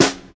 Good Snare Drum C# Key, Royality free acoustic snare sound tuned to the C# note; snare drum samples